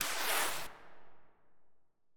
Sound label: Arrow; FMOD; Arrow Wall; Enviroment